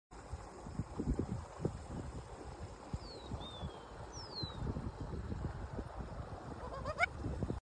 Houbara; هوبره

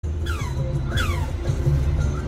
FM gear whistle; جیغ دنده یا سوت دنده

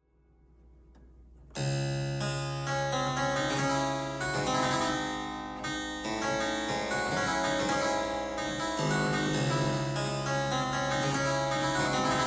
Harpsichord

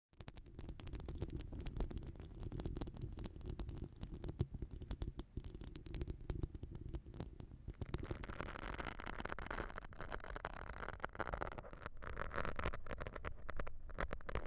ASMR long nails

